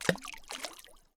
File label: water splash